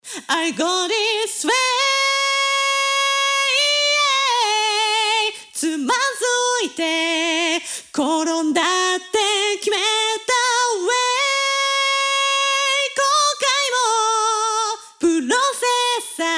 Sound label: vocal after